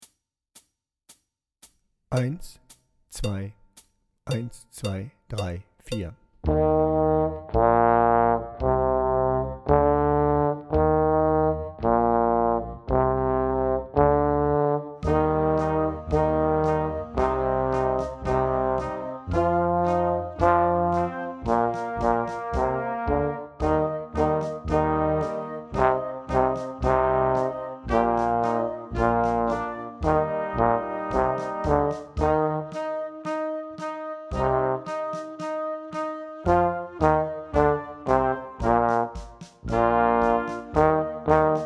Posaune lauter; March

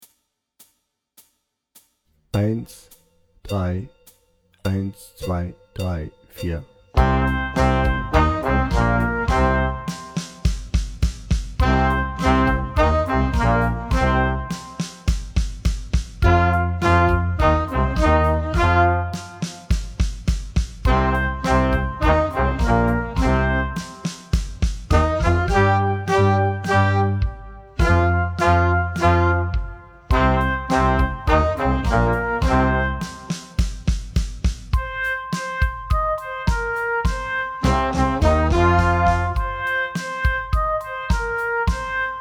Hard Rock Blues langsamer

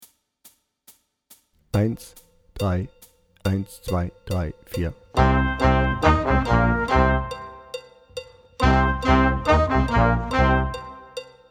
Hard Rock Blues ohne Drums

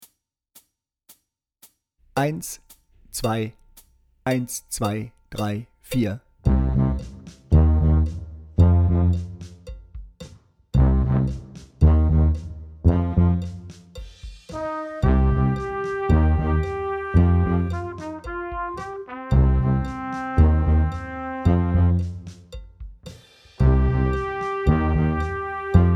Heavy Metal Tuba lauter

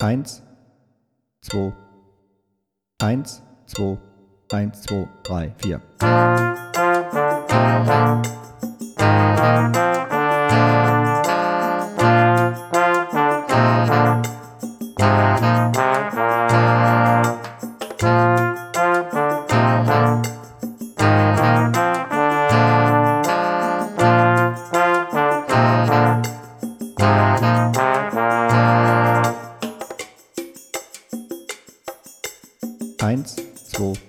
ohne Drums

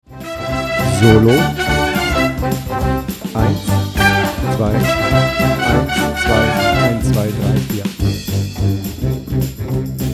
Einzähler Halbe 108 Solo Playback

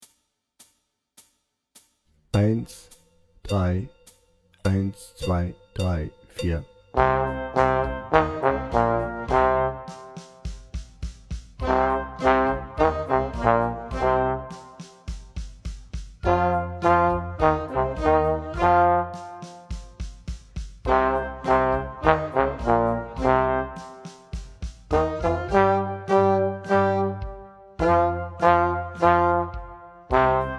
Hard Rock Blues Posaune lauter langsamer

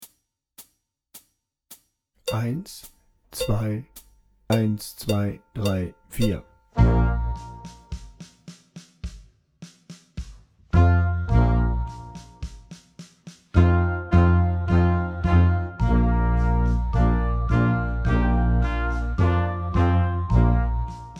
Rock Tuba lauter langsamer

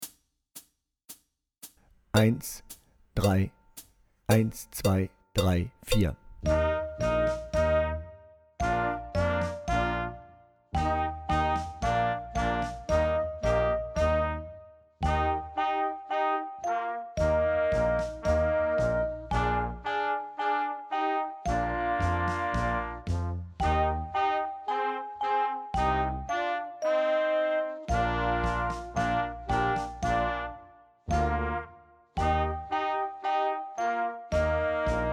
Glockenspiel lauter

